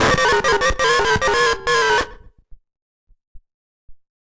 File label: random synth failure, malfunctioning synth